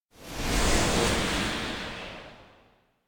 white shadow couple appear; anemoi

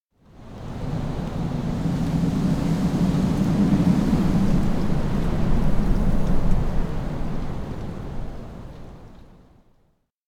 wind pregame; anemoi

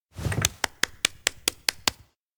applause snap

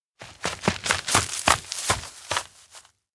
steps outdoor wheatfield runup; anemoi

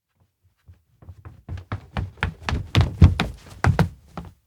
steps outdoor lakeside run up kid; anemoi

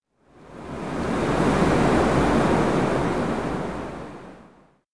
strong wind; anemoi

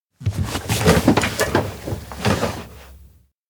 shelf box pullout; anemoi

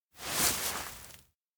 wheatfield turn female; anemoi

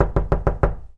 doorknock; anemoi

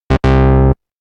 buzzer wrong; anemoi

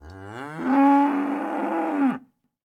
cow voice; anemoi

